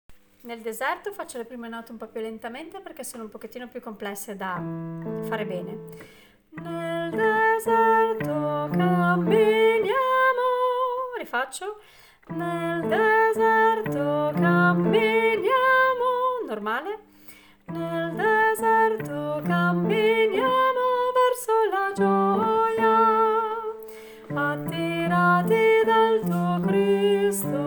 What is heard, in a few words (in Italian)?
nel deserto camminiamo soprani